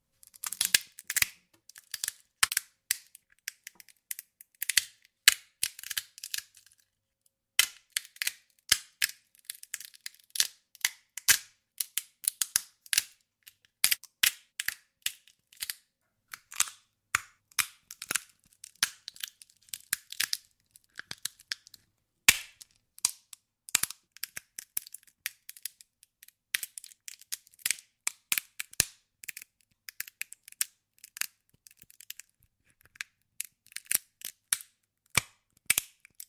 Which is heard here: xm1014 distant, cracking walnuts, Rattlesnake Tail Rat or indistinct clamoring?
cracking walnuts